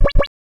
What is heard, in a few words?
double Beep